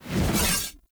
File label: Two Handed Axe Whoosh Heavy; Weapons; Enemy; Axes; Sinecure Two Handed Axe